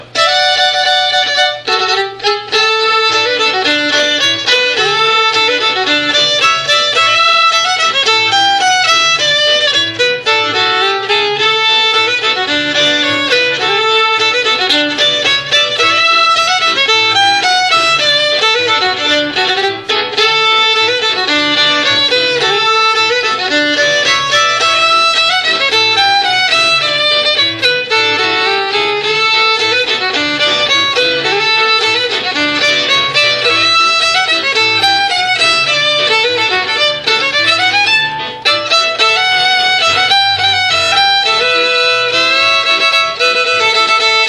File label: valley two step, fiddlers